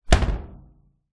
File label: household washing machine top loader plastic lid down